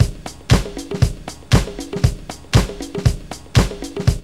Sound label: DISCO LOOP; Zero-G; Funky Elements